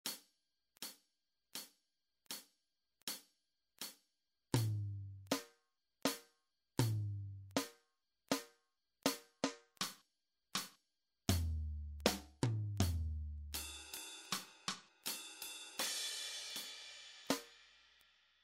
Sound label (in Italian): Esercizio ritmico